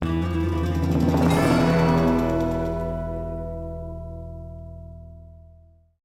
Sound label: Quest Bounty Win